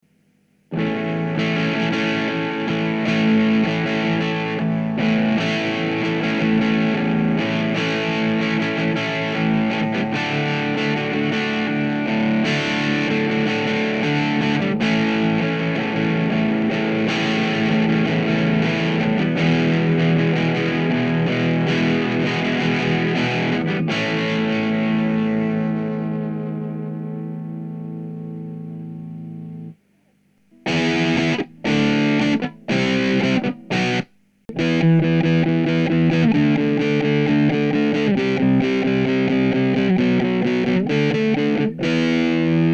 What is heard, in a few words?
MESA MK IV